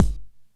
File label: Kick Simple Tone